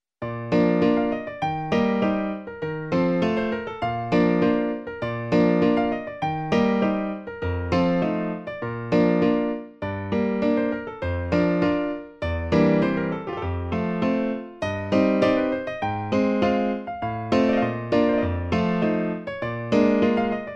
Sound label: Andantino quasi allegretto; Thieriot